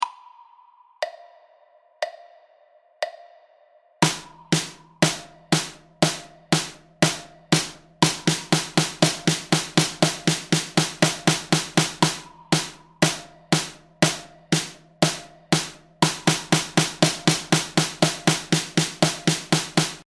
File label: stroke, drum